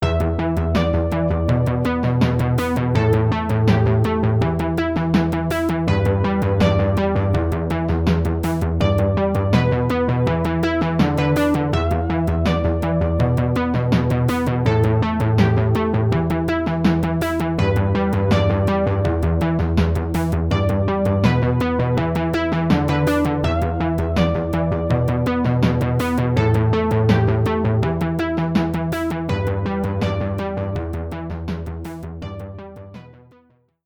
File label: standard lead aco drums